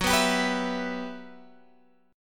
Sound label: E Minor F sharp, chord; Em/F#; strummed